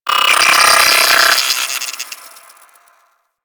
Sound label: Space Drone B Attack A; Warframe; Corpus Space Drone